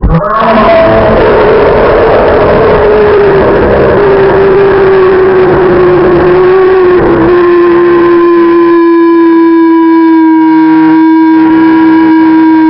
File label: warcry; skibidi toilet